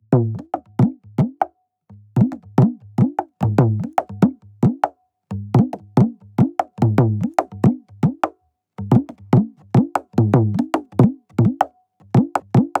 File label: Dogor Loop BPM 75, Assamese folk; Gully Loops; waves of bihu